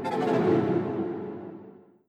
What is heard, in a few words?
Accordion Down